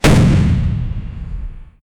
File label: a charging sound for an, blast